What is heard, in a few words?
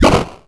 Charge; super soviet missile mastar; ios gamerip 2011